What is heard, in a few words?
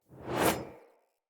Chop Entrance Axe Whoosh; Sinecure